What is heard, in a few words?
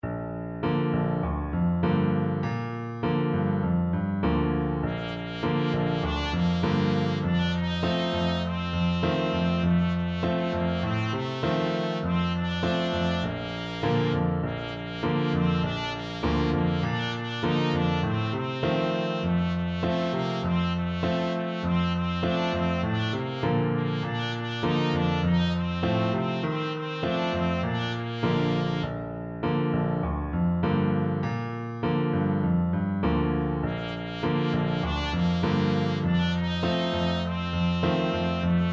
trumpet